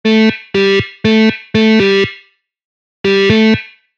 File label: Guitar licks